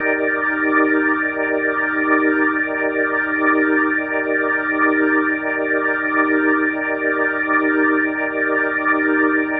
Medical Organ D# Maj7; Major 7; The Vitale Organ

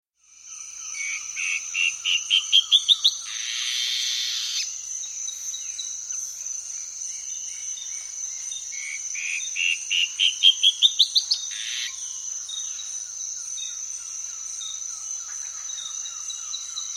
Dusky-Throated Antshrike; S. America

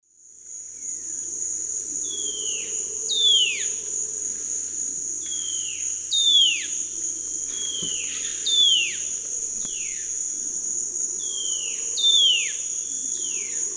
Hylophylax punctulatus, calls 2 birds; S. America; Eastern Lowlands

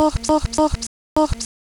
Vox Glitch; Ambient Cinematic; Vox Loops

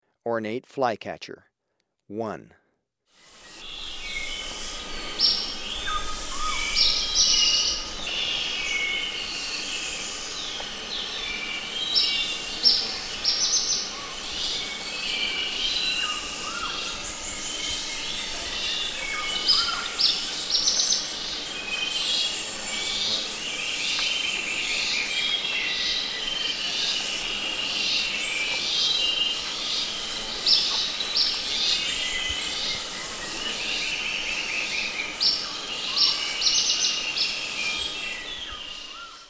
Ornate Flycatcher, Birds of Ecuador; S. America

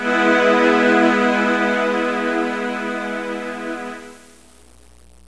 QUARTET E; INSTRMNT

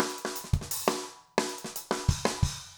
jungle 170 fill

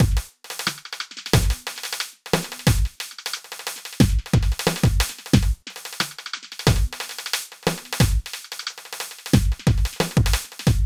FX Beat, Rock Drums

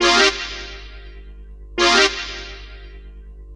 Synth, Techno

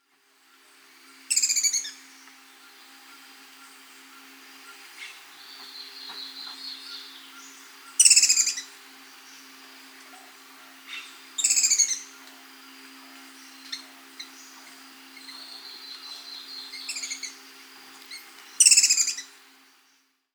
Sepia-capped Flycatcher (Leptopogon; S. America

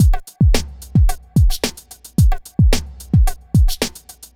r n b kit 110

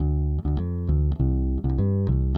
Stingray, Rock Bass; Old Grey Stingray

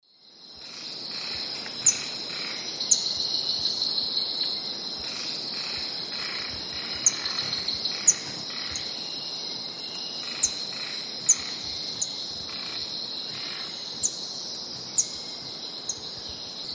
Heliothryx auritus, song; S. America; Eastern Lowlands

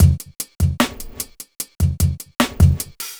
hiphop

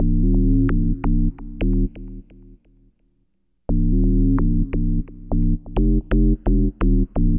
Bass, Breakbeat

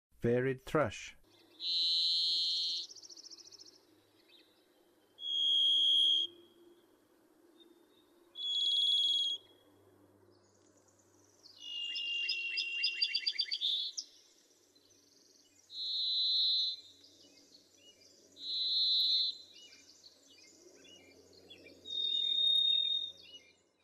Varied Thrush, Songs of Birds of North America

